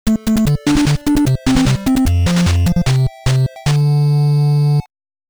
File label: Final Victory; king kong 2 ikari no megaton punch nes